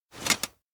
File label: hand stop; rikka; anemoi パッケージ版 1.1